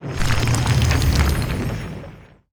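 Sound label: satanic gate death, monster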